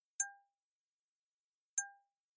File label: highest ping